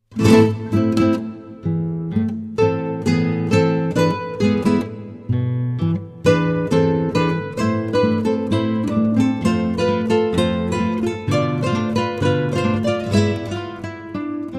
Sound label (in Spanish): Tango